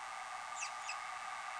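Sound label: American Robin call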